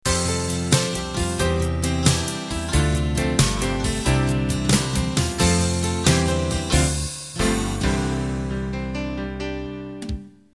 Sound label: 12-8 Ballad End